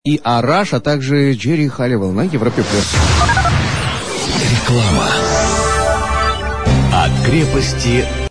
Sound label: dtmf jingle